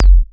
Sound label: chirp kick, Electro FX; UNUSUAL KITS and FX; Atomhard Electro FX